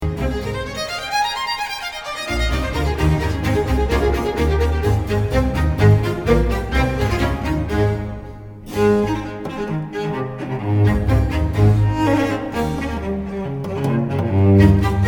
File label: Cello Concerto in A Maj Allegro; CPE Bach